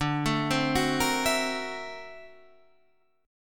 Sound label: D 7th Flat 13th D, chord; D7b13 arpeggiated; JGuitar